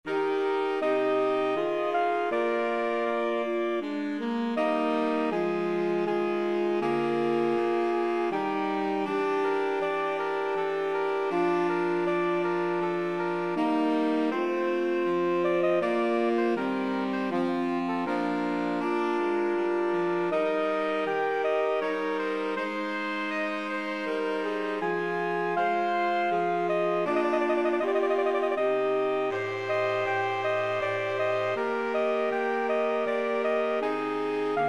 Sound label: bist du SAX quart